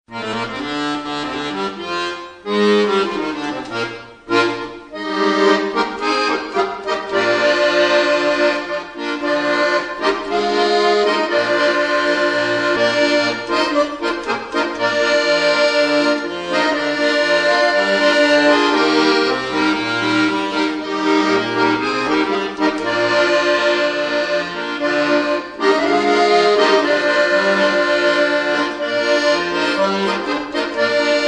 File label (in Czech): tahaci harmonika akordeon